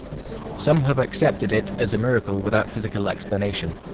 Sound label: noisy opus 6k